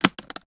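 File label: Dictaphone; alone in the dark 4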